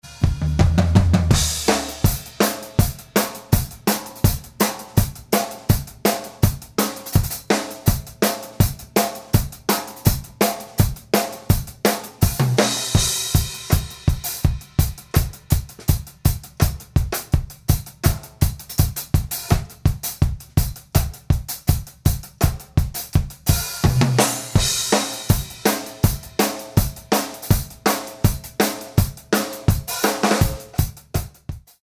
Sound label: Drums Plus karacterised Mono Room bypassed